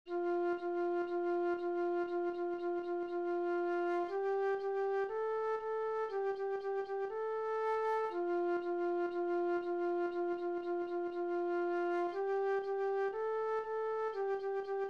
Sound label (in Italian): PICCOLA CANZONE Flauto SOLO PER STUDIO